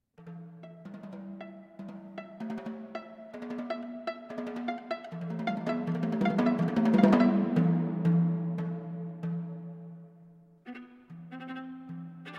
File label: Variations for Four Drums and Viola; chamber music